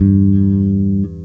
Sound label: BASS OVER, Electric Basses; E-MU Producer Series Vol. 8 – Platinum Phatt